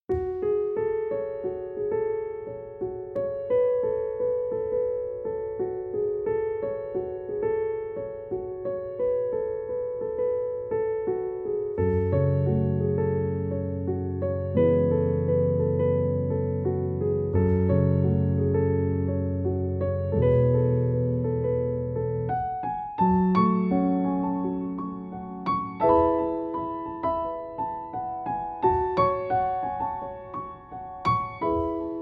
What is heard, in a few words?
Piano Version